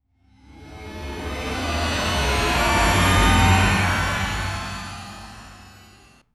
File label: a sound that represents a, parallel dimension